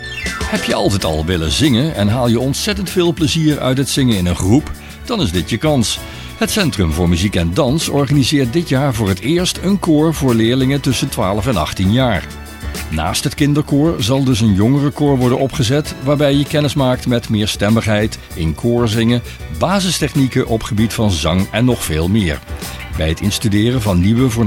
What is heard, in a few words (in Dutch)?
koor zingen